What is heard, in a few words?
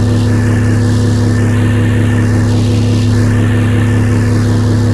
Imager Small Loop; moul-assets